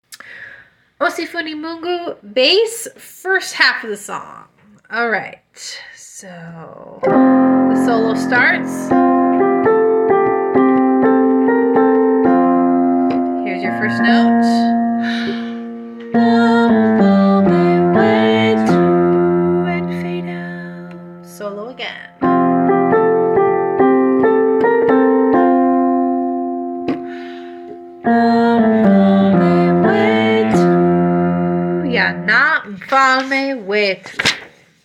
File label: Bass